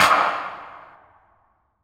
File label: Electro Car Speakers Snare